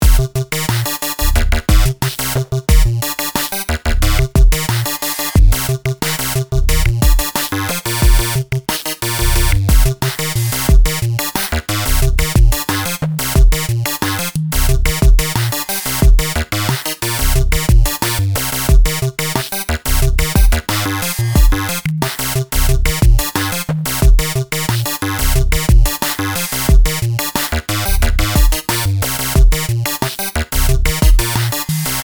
reset repeat drums, sequencer; Numerology; Five12

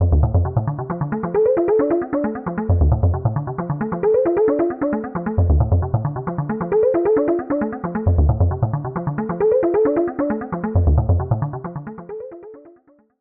PLUCK Plucky Mallets

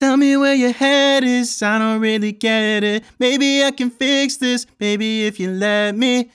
dry vocal; manipulator

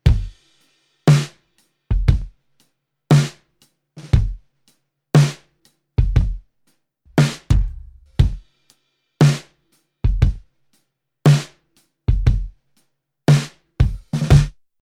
kick snare with effect

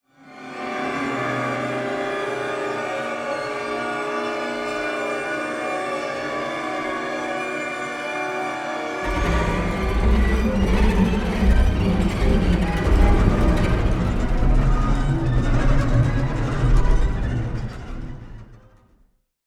Accent Pads

